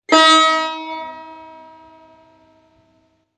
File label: tar, "mi" c